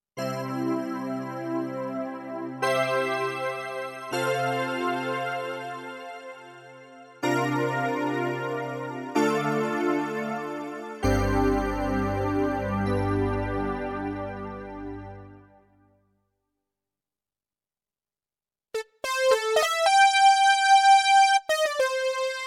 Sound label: MP6 Diverse